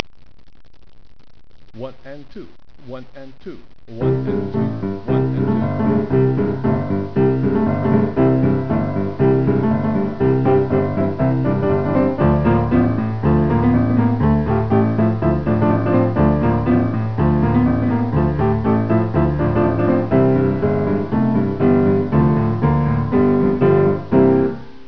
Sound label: samba